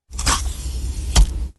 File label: Click Plop